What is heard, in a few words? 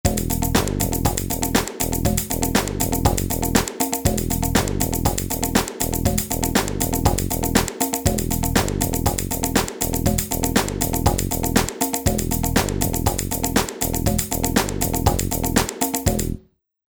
House